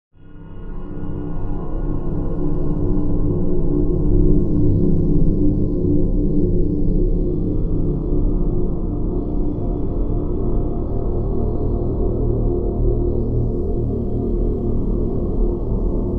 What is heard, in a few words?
dark dungeon ambience full fade out